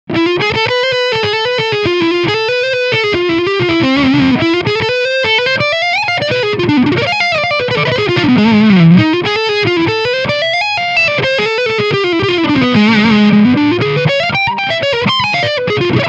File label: Leadline